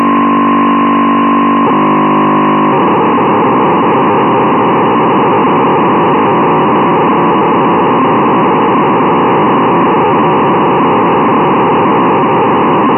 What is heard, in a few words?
SDRSharp PSK majak 432750; Small EME; QO 100 setup